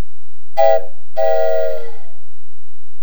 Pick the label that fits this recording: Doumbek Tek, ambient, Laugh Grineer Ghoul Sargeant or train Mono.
train Mono